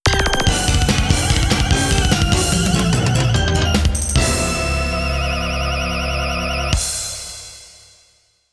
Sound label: Game Start [Roland GS]